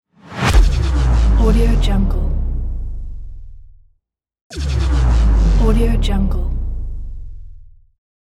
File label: cinematic whoosh hit bass drop downer